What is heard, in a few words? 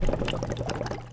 bubble boil